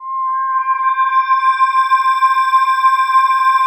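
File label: PAD, atmospheric pads; Best Service; XX Large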